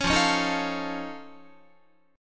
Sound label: F Augmented 7th F Guitar, chord; F+7 strummed; JGuitar